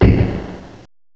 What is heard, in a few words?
RIM; DRUMS; M8; CMI Factory